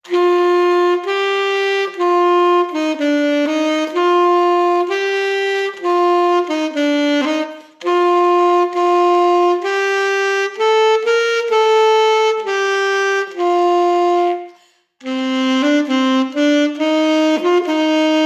Saxophon; Oh du Froehliche

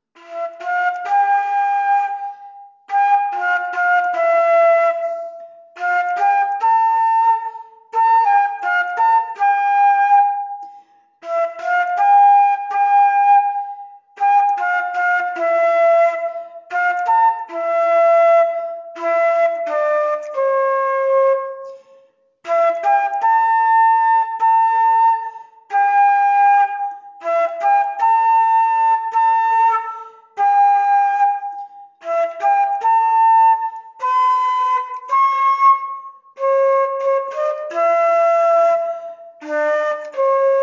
Die Weihnacht Querfloete

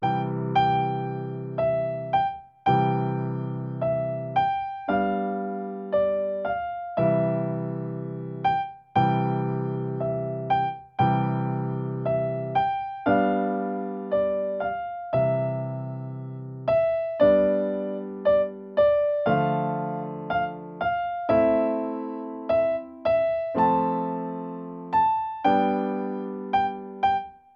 Klavier; Ihr Kinderlein kommet

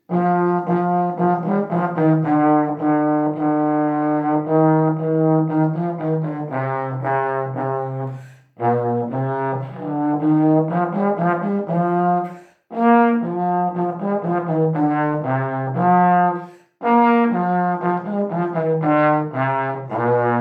Lasst uns froh und munter sein, Posaune

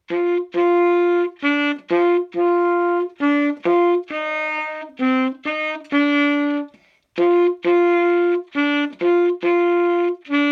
Sax; Ihr Kinderlein kommet

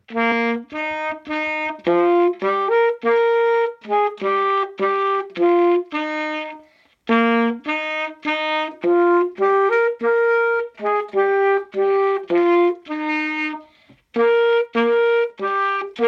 Es wird scho glei dumpa, Sax

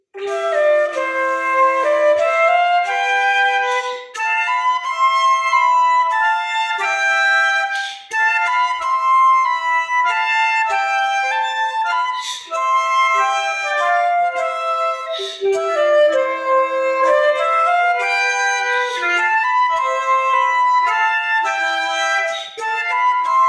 Querfloete; The first Noel

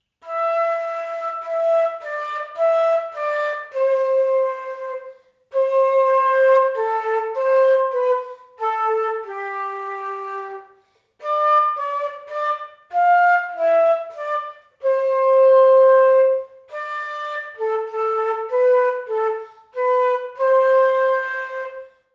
Leise rieselt der Schnee, Querfloete

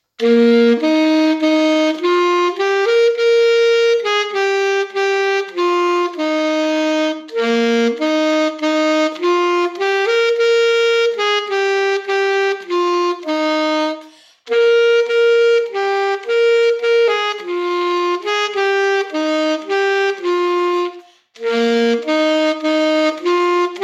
Es wird scho glei dumpa, Saxophon

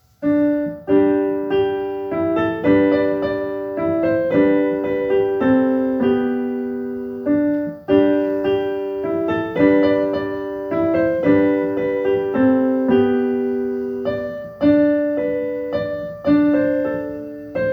Klavier; Es wird scho glei dumpa

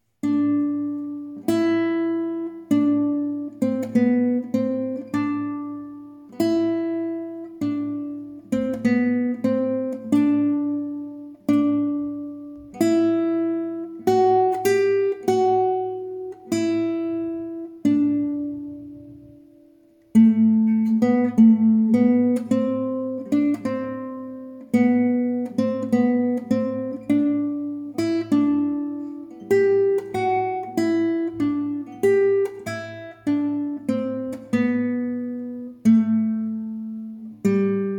Oh du Froehliche, Gitarre

